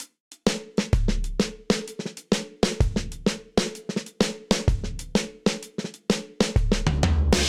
Snare Old School 128bpm; Ableton; Factory Packs; Session Drums Studio

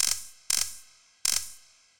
IDM Perc, IDM Percussion

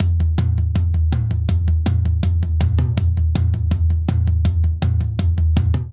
Punk Rock Toms Only 162 bpm; 4 4; MIDI Clips; Ableton; Factory Packs; Drum Booth

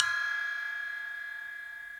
SPLASH 08 side stick atmo, Cymbal; Ableton; Factory Packs; Drum Booth

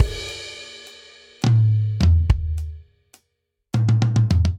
Country Breakdown 209 bpm, Drum; 4 4; MIDI Clips; Ableton; Factory Packs; Drum Booth

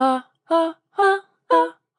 Hocketing Rack C Lydian, Complex Vocal Racks; Voice Box; Harmonic Vocal Racks

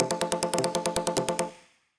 Cycle Clicker; Effects; Ableton; Glitch and Wash

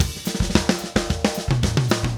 110 Gypsy-Bossa Drums; Pop Rock

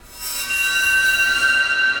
Arcocymb Ensemble, Dark Textures; Multisampled

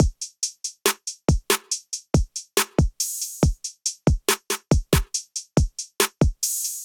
MPE Vagrant Kit 70 bpm, Trap Drums; Trap Drums by Sound Oracle; Ableton; Factory Packs

